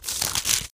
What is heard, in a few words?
book page turn; video games; player; taunt; luxury lounge; source engine; tf